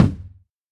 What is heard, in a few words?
ambient bass drum sample g key, Royality free kick drum single shot tuned to the G note.; kick drum samples